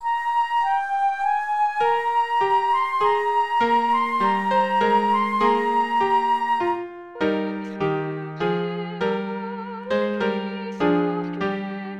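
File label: Tenor, rehearsal tracks christmas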